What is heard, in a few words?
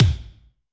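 Kick Bright Stab, Alesis D4; Kik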